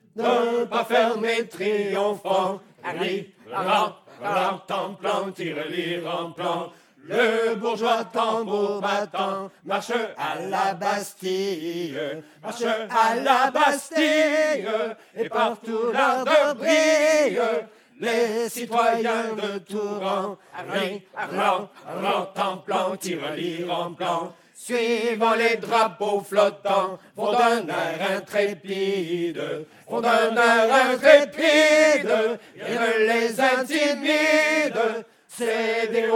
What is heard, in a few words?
Choir; assassin s creed unity gamerip 2014